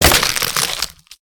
zombie massacre head smash; corpses

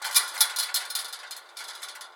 chain link fence; sonniss gdc

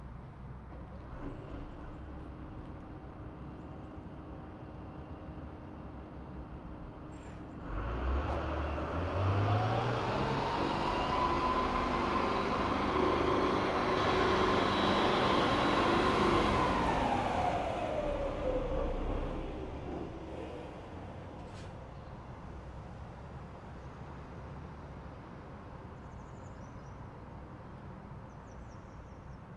exterior fast distant forward reverse

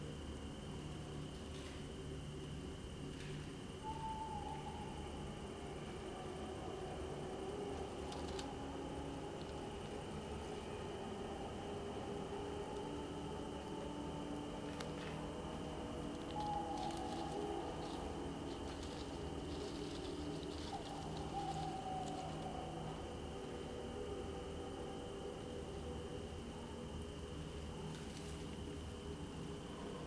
Spooky Eldritch Forest; side quest society

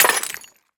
building collapse debris impact glass short; bluezone; sonniss gdc

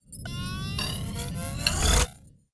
Start Sequence

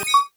Confirm; Nexus

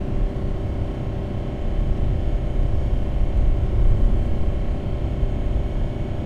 dacia sandero ii int cabin 4k rpm loop stereo; sonniss gdc

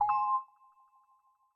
ui alert; the library by mtc; Sonniss GDC

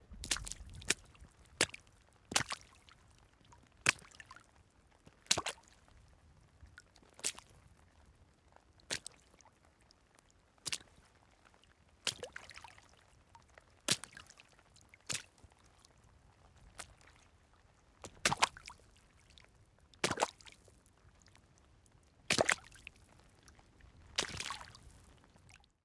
footsteps water puddle single splashes; fvtt sonniss gdc audio

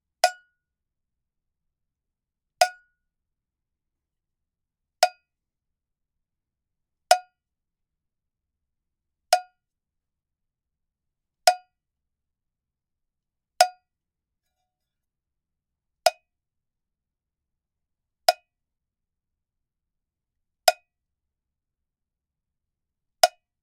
modern bell cowbell straight muted stick hits; fvtt sonniss gdc audio

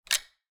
revolver prepare; ranged weapons guns; psfx

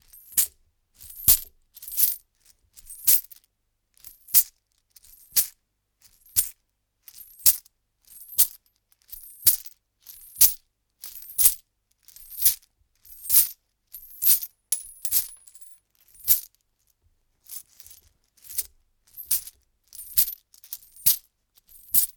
coins sack throwing; Sonniss GDC

